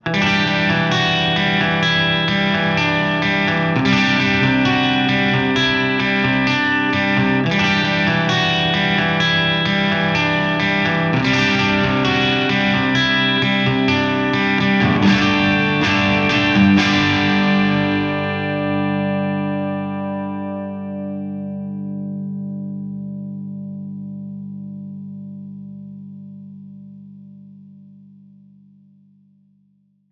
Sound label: Studio Gloss ON 4824